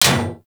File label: Bullet Impact Generic Enemy Shield; Warframe; Weapons; Bullet Impacts; Surface